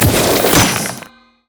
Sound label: item sightward spider; LoL